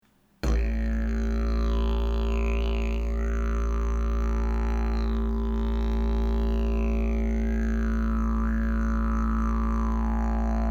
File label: didgerdioo